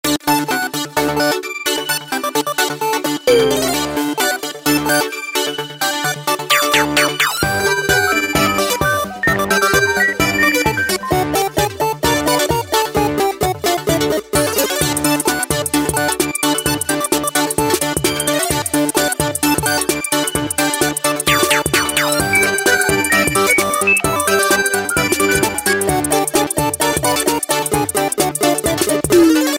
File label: CatchMe, ringtones; Samsung; Galaxy A SHW-M100S